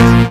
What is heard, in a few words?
Synth Sound; Vengeance Samples; VEE Synth Shots